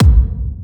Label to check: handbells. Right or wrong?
Wrong